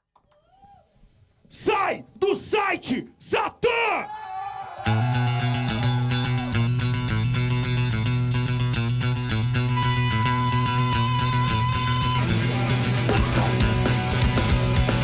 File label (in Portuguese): ao vivo no pub; st paul s pub